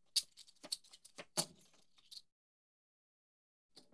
Tokens Jingle Metal

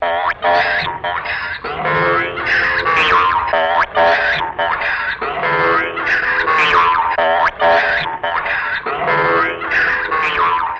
Frantic Elect Soun, electronics

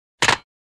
Phone Receiver Hang up

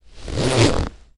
Denim Jean Zipper; zippers

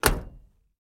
Camaro Door Close Lig, chevy camaro

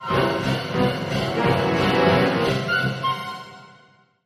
Music Logo Comedy Orchestral

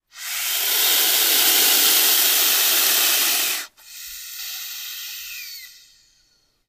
Boa, Albino Hiss. Close Perspective.

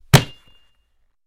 Football Catches

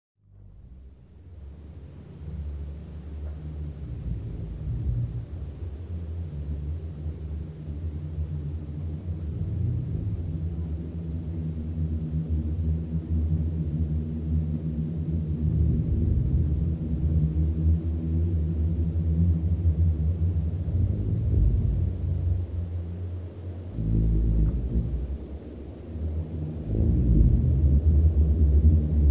Eerie Wind With A Deep Moaning.

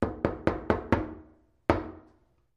Door Knocks Wood

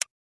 Push Button Latch, buttons

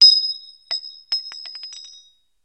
Bullet Shell Drop On, shell casings fall

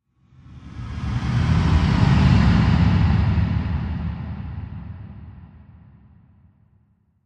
Descend Echoing Descend with Reverb

